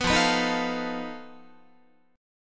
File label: F 7th Flat 5th F down Guitar; F7b5; strummed; 14,13,14,13,13,16